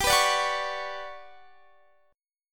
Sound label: G Major Sharp 11th G, chord